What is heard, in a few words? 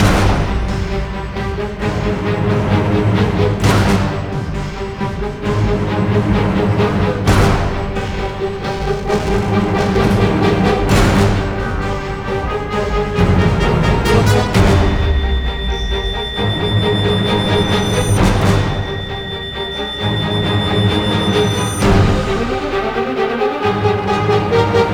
Suspense; Prototype